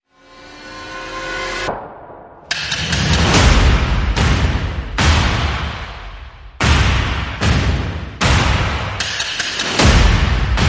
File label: Percussion and Effects